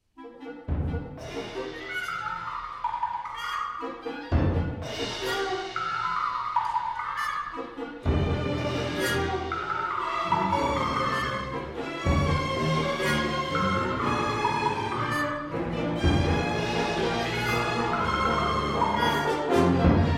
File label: orchestre symphonique